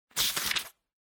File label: Book Flip